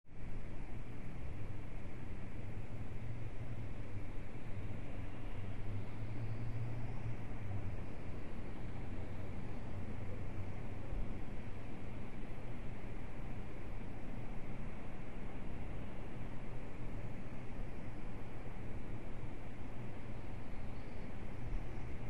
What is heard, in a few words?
Room Medium Traffic, traffic, distant